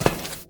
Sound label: Footstep metal; this is the zodiac speaking 2020 gamerip switch ps4 xbox one pc macos